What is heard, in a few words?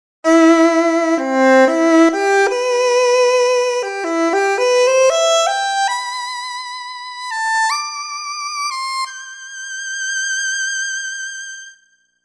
Solo Vln; TURBO VL